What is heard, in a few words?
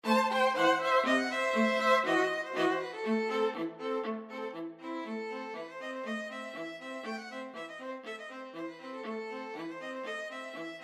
freilechs von der chuppe, 2 violins viola